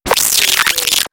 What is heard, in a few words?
Turntable Scratching Reverse